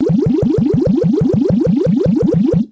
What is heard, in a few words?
Multiple ray gun blasts. Zap, Ray Gun